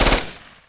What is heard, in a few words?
mp5 burst; firearms